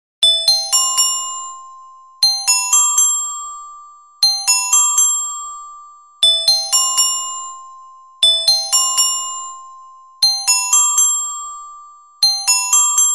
CARILLON CONTRALTO

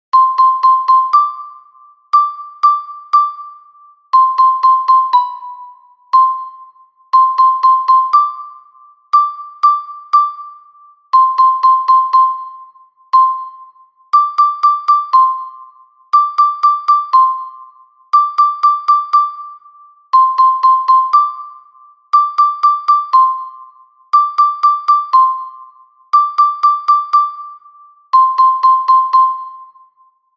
Xilofono Soprano

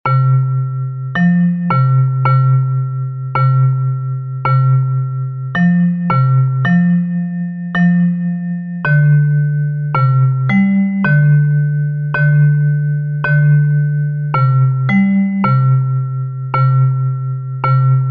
RUMBA Metalofono Baixo

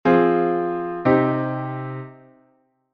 cadencia plagal, Dous acordes; CADENCIAS

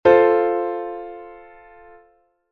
acorde triada

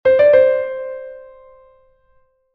mordente

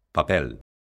papel

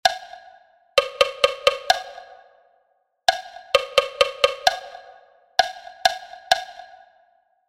motivo ritmico

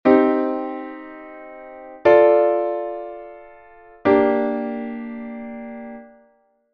acordes menores

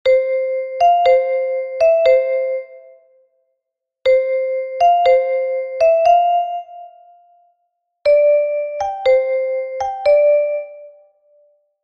RUMBA Metalofono Soprano

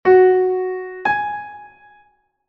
fa#- lab; Clasifica este intervalo

